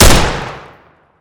ultimax; garrysmod

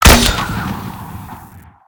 nade launcher; garrysmod

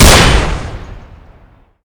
automag; garrysmod

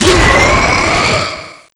hell death; garrysmod

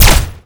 laser rifle; garrysmod

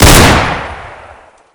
crye; garrysmod

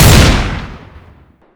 shotgun

